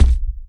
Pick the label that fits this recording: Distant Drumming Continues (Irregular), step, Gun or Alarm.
step